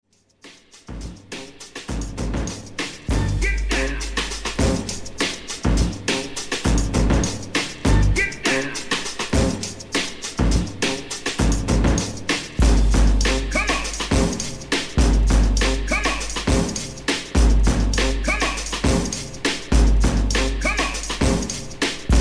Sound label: scratch beat; beat junkie turntable tools